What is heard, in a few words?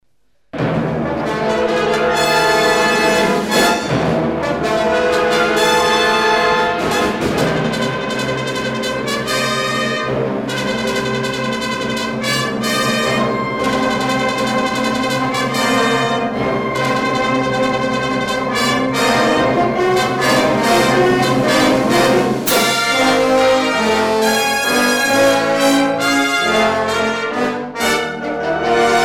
Fanfare